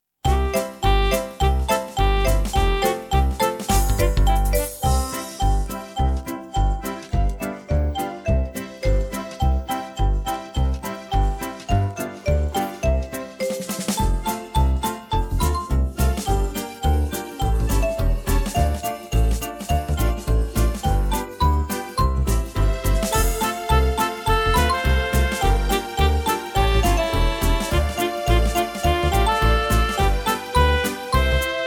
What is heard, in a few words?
instrumental